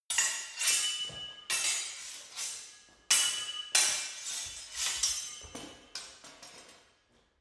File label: sword hit sword sequence